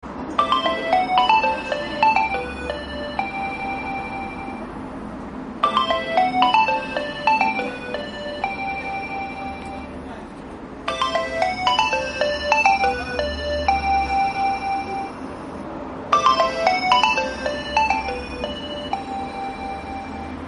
nokia 2300

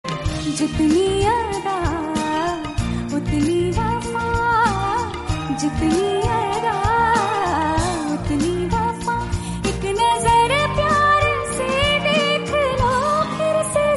female

